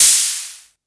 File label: big room drum crash sound f sharp key